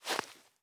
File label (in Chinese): 细雪踩踏声